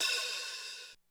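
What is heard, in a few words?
Hi Hat Open, Korg DSS-1 Drums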